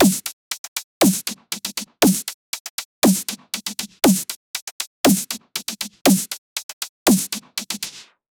119 bpm dubstep snare

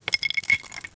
ice congelation

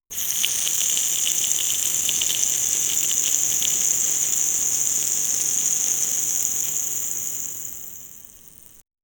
gore

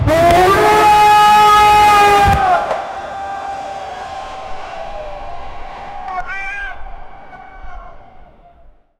the thundering rush of ca, cavalry

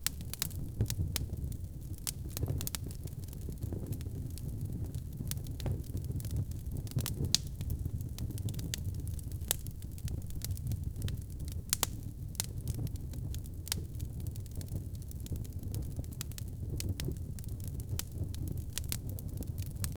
large fire burning down a; disaster

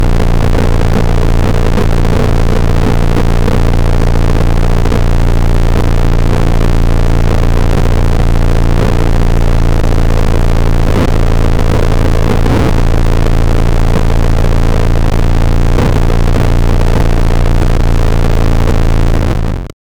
simulating the sound of a, automotive

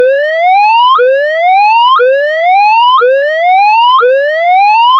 wwii emergency alert sire

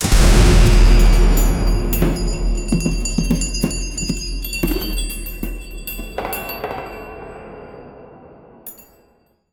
epic transformation sfx, ethereal wind

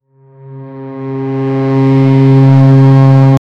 quiet ship horn; nautical

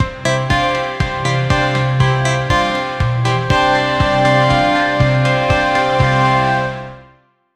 radio jingle

